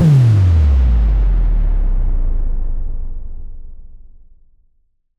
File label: Impact; impact samples